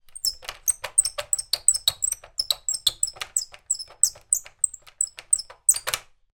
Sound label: foley metal squeaks turn small; zapsplat